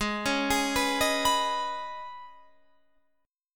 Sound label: Eb 7th Suspended 4th Sharp 5th Ab